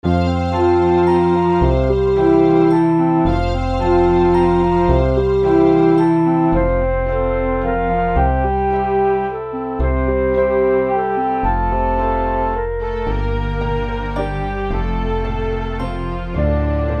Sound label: Romantic Ballad